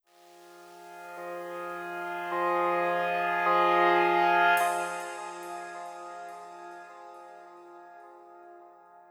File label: Swelling Pad; BB 2HTC Samples Vol 4; Pads